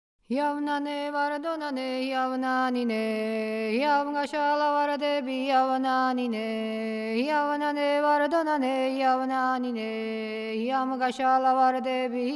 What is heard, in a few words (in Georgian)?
iavnane moxevuri samkurnalo; Mzetamze